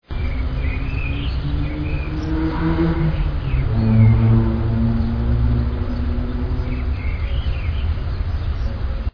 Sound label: gear change